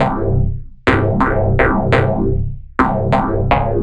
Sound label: Cruddy chord, break beat